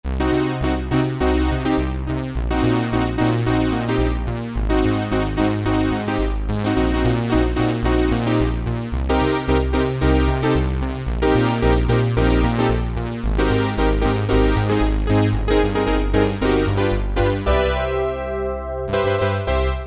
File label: Stereo Polysynth